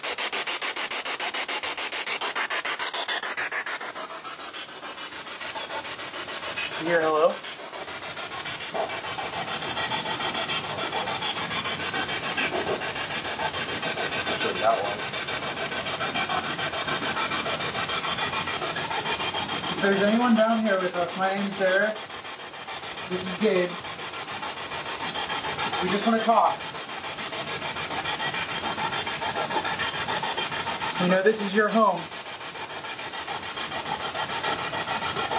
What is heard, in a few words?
Spirit Box; Basement; wildwood sanitarium investigation